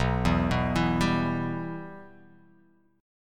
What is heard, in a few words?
B Suspended 4th Sharp 5th B; Bsus4#5 arpeggiated; JGuitar